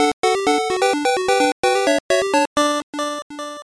Gorilla Selected

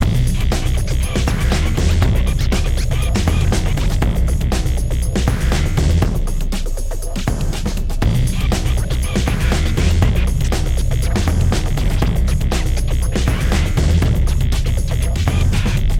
Rhythm; PixelJunk Shooter 2; ps3

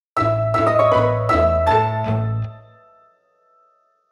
Intermission Animation Music; Pushy and Pully in Blockland

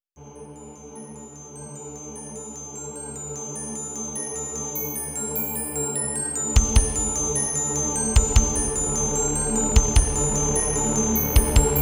Clock Tower; Clock Tower 20th Anniversary Sound Collection

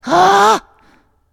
Yellow Surprised; Character Yellow Surprised

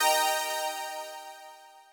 Rush Clear Eb7

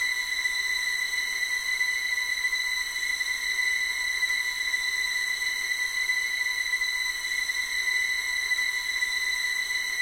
Caves Suspense Loop; assassin s creed chronicles china

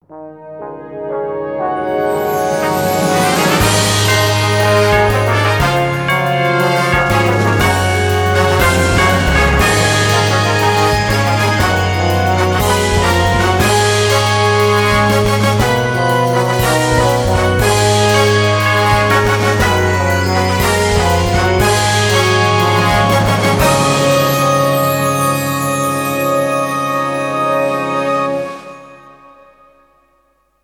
Win

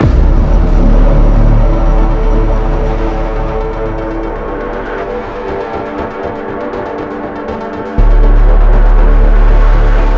Prematch Loop; Korps; Modern Combat Versus

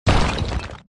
Sfx Enemy Golem Dead

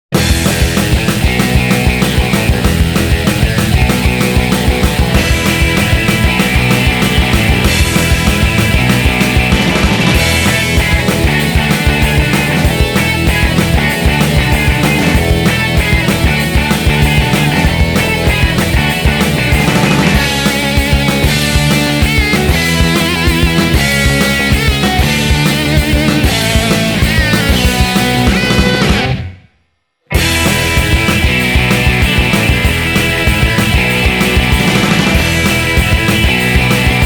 Music Rec Room

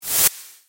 (SFX) Block Push

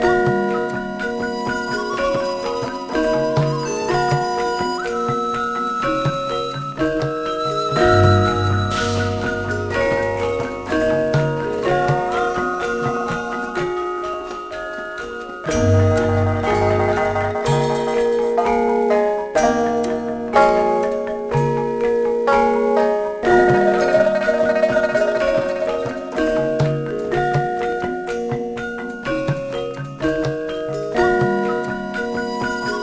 MUSIC LEVEL

